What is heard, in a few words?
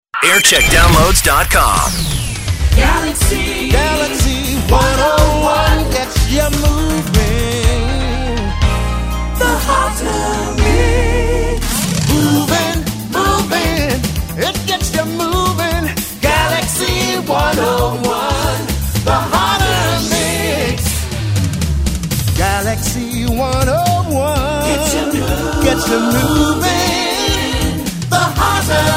jingles; galaxy 101